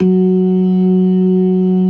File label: LOW MAN, ORGAN; Synth and Organ; InVision Interactive; Keith Emerson; ORGAN SPLIT